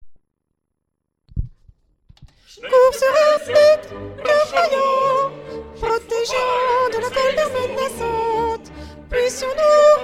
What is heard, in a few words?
flute; FAURE BENEDICTUS